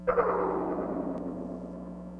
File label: bell